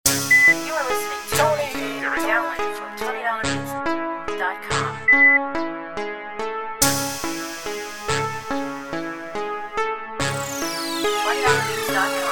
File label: Trap, beats